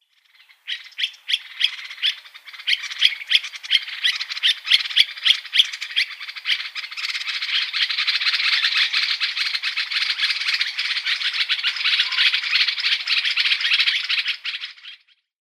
Common Bulbul calls

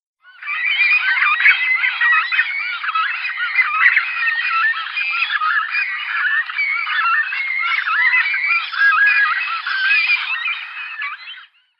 Glossy Ibis nestlings

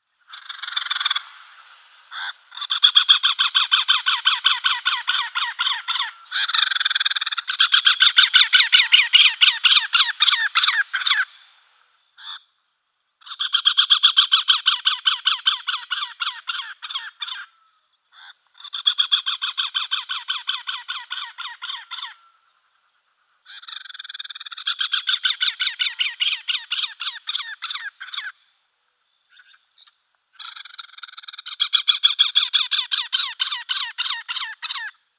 Great Spotted Cuckoo calls

